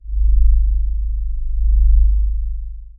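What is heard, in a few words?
data whisper a soft lo fi; message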